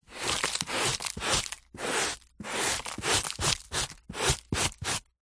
sweeping up dirt debris carpet dustpan brush; foley; zapsplat

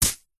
leisure hacky sack drop

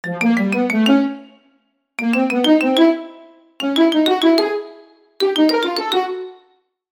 cartoon ascend climb sneaky orchestral sequence

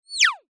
cartoon whizz past fast

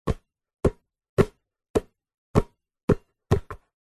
sport basketball juggle between hands slow; zapsplat

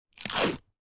office sticky tape remove from surface

